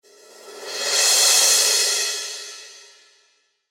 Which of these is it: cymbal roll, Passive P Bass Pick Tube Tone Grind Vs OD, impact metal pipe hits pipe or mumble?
cymbal roll